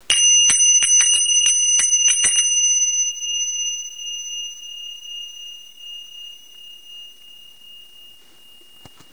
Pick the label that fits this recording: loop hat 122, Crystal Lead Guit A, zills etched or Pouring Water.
zills etched